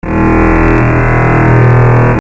Bass; aloneinthedark4

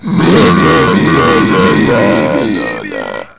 DUO BURPS

